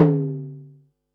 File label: snaredrum, Pearl SC-40